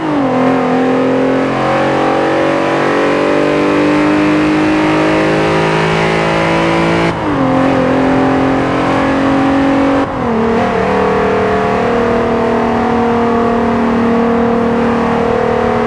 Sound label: fourth cruise, vehicles; gmod; tdmcars; golf r32